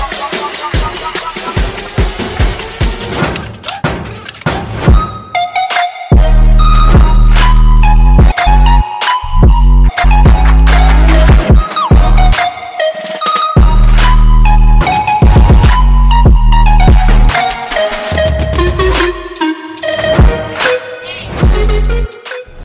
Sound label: best instrumental ringtone